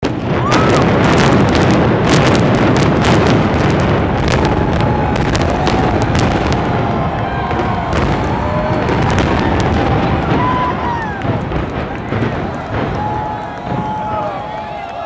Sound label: stadion crowd; kingdome